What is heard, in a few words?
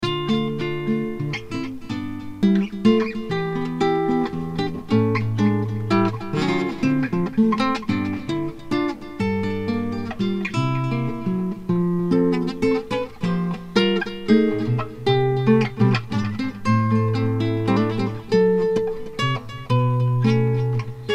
piano